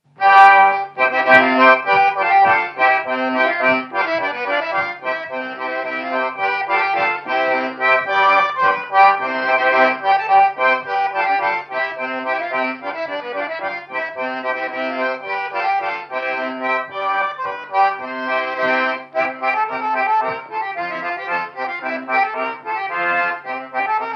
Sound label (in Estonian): Vanapapa polka Rouge loots folk